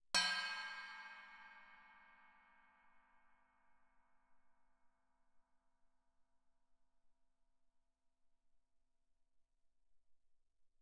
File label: chinese stick bell mf, suspended cymbals; Percussion